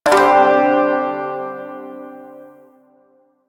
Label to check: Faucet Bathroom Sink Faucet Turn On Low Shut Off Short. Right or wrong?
Wrong